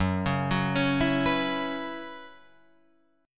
Gb 7th Suspended 4th, Guitar Open F; Gb7sus4 arpeggiated

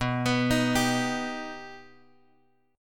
B Augmented B, guitar; B+ Chord {X 2 X 4 4 3} arpeggiated

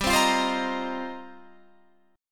F 7th Suspended 2nd Suspended 4th

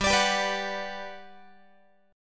G Major 7th, G, down, Guitar; Listen to GM7 strummed; GM7 Chord

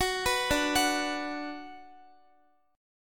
D 6th D down Guitar, chord

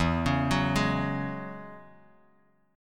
E 6th Add 9th E down Bass Standard; E6add9 arpeggiated; JGuitar

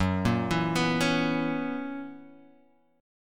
F sharp 11th F sharp Guitar Standard; arpeggiated; JGuitar

